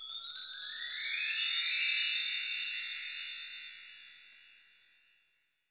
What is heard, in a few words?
Bell Tree; Greytsounds VOL-1; SDX PERC